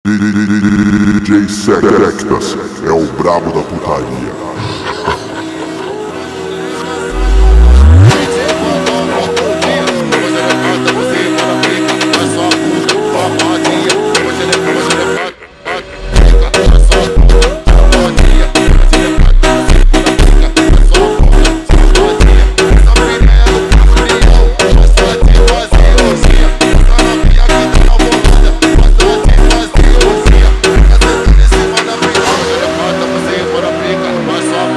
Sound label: Analog Funk Slowed